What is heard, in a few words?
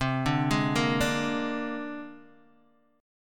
C 7th Suspended 2nd Sharp 5th, C, down, Guitar, DADGAD